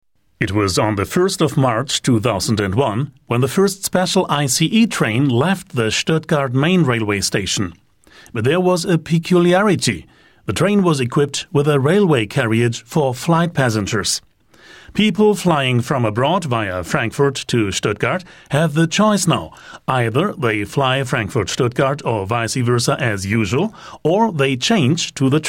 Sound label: dokumentary